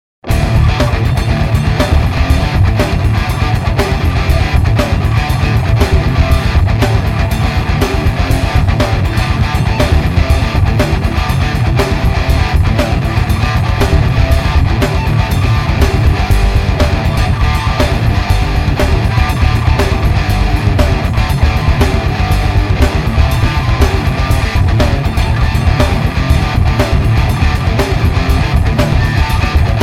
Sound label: Power Modern